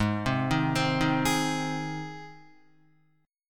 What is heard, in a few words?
Ab Major Ab down Guitar; arpeggiated; JGuitar